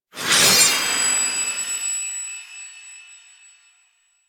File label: Queens Lair SFX Draw Sword; Teshin; Warframe; The War Within